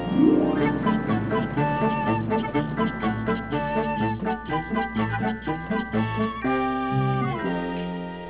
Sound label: march; bradybunch